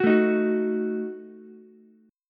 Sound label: D sharp Diminished D sharp Ukulele; strummed; D#dim; 11,9,11,9; JGuitar